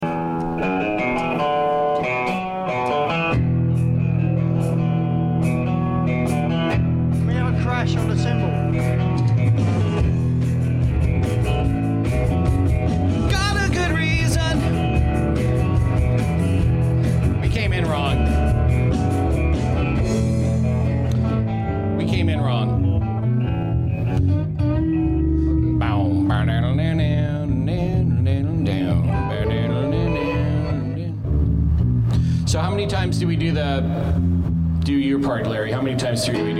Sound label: Background Chatter